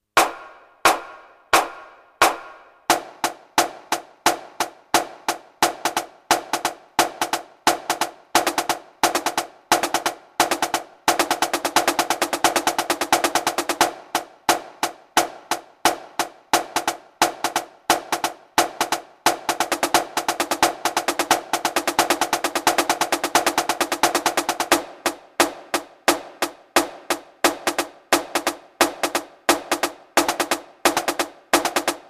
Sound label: paradiddle diddle